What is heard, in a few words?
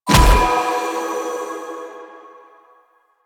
Choir Bow Arrow Explosion; Warframe; Weapons